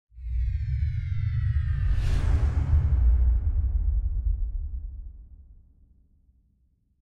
bonus Out; fairymirror

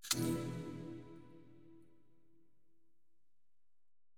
spin Button Quick Stop; fairyhansel

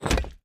monkey Place; jumanji

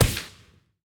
win Symbol Flash Add On; fairyhansel

